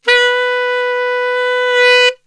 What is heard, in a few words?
B 2 SAX, Brass Super Section; Best Service